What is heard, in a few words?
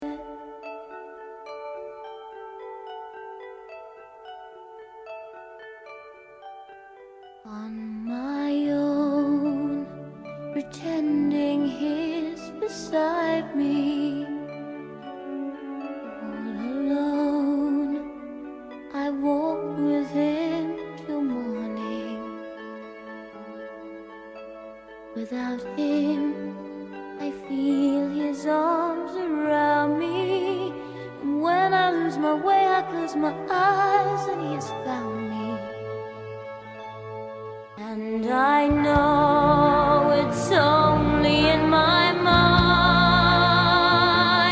Vocal Guide Track